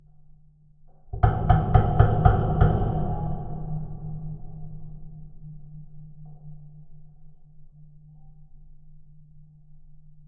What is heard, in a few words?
Geofon, Harbor, Bridge Moving; underground sounds